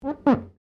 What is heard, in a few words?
GLASS SQUEAK FINGER